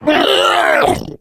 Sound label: female boomer spot prey, voice, attack; Left 4 Dead 2